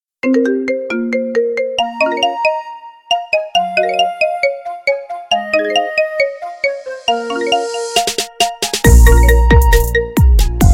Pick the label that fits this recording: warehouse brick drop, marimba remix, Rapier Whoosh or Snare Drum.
marimba remix